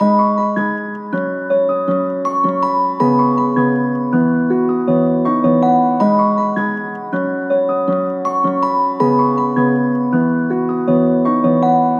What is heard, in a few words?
Nostalgic Melody Loop 160 BPM G# Min; Cymatics; 2020 Melody Collection